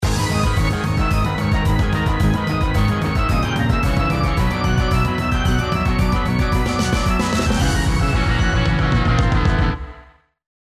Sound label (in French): halloween poursuite toccata